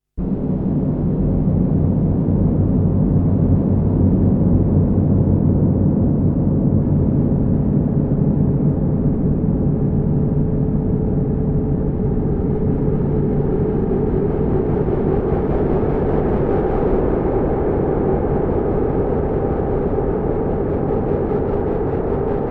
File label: ASM Hydrasynth Space FX